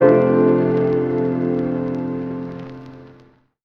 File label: dinner conversation, Guitar